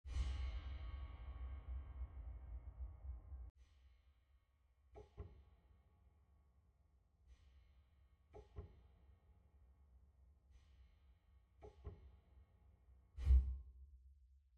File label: dampfer